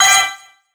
win symbol Flash; netent

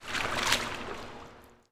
fish Splash; netent

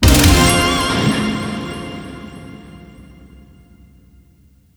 medium Win

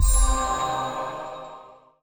spin Sequence; netent

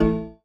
Default Keypad; WildWater

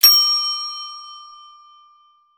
add fs hit; netent

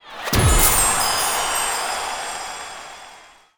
win medium; netent

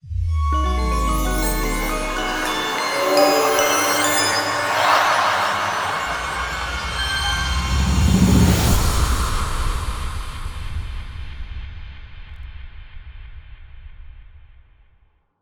big Mirror Appearing; netent

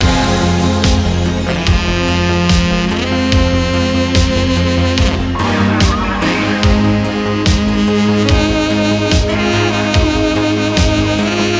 maingame loop mobile; netent

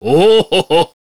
Medium Win; santa; netent

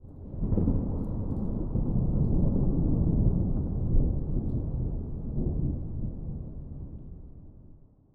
thunder distant; dracula

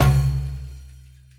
spingle Drum End; netent

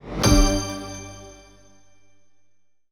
wild land

